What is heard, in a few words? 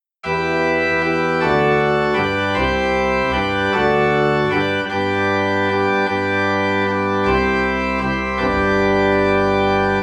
Organ in E Minor; christmas; We Three Kings